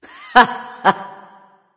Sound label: "heh heh"; smite; nike